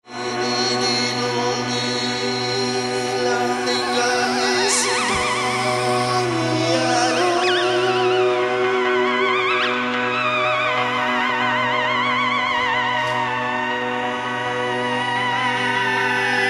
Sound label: Theremin; atelier Theremin